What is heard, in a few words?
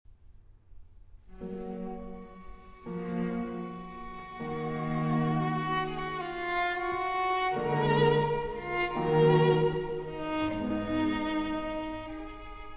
Quartet in A Major, Largo Con Sentimento; Quartet No. 14; MS 41